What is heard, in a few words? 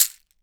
CAXIXI ACCE, BRAZIL